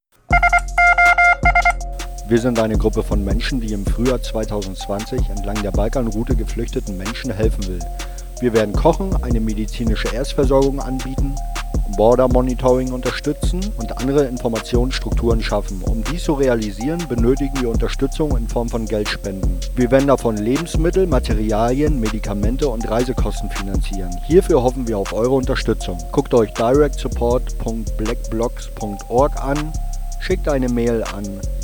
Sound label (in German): Jingle kurz